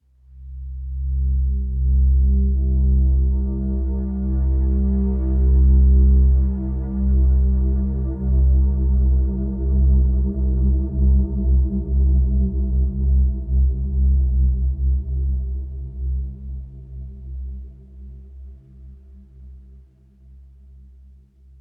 Drone; Martian Chess

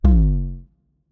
3D Invalid Move; Martian Chess Audio

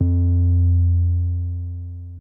Drum BPM110; Martian Chess

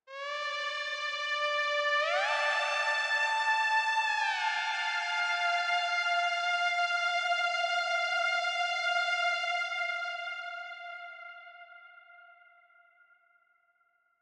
Lead Synth; Martian Chess Audio

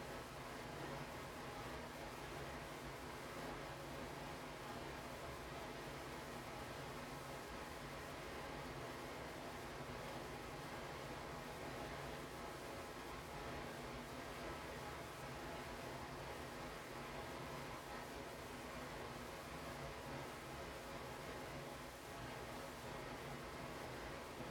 Noise; Martian Chess

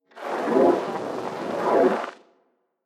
Piece Captured; Martian Chess Audio

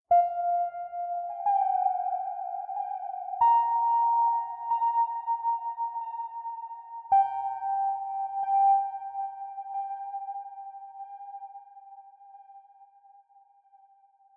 Pings; Martian Chess Audio

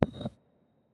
Pickup; Martian Chess

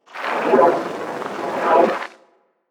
Piece Captured; Martian Chess Audio

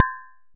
impact Glass medium

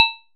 impact Metal light

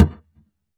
impact Plank medium

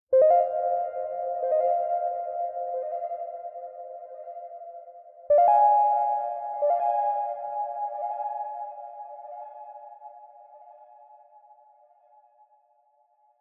Pings; Martian Chess Audio

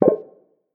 Put Down Piece; Martian Chess Audio

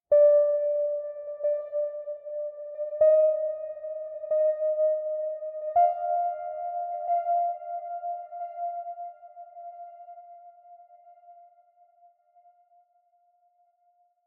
Pings; Martian Chess Audio

